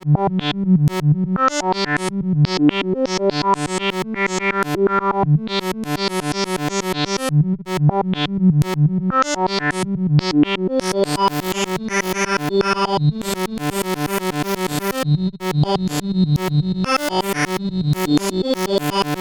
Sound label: Linnaeus Linear FM with internal OSC Tracking Off; Rossum Electro Music